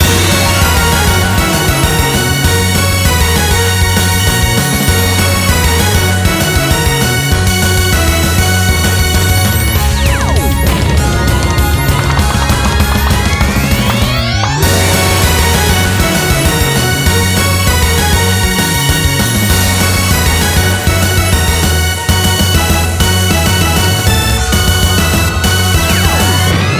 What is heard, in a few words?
BGM Reach